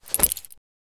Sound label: grenade throw